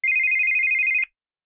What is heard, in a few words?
ringtone